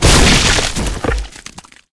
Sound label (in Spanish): caida